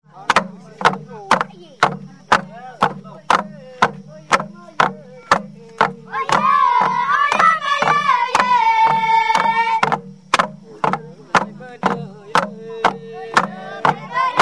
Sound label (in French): jungle danse, malaisie